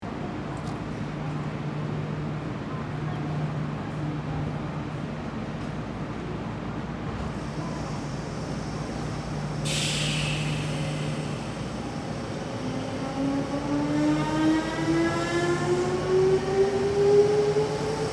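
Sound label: station atmosphere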